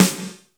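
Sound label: THIN METAL GATE, SNARES; InVision Interactive; Lightware VOL-1; Instruments & Percussions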